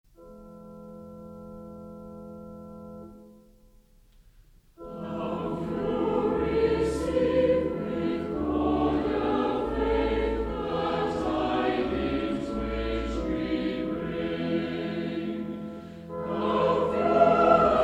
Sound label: Choir, hymns